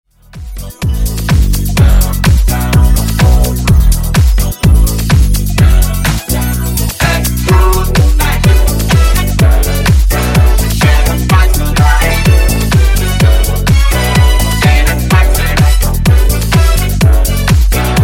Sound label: Funk Nu Disco House 126Bpm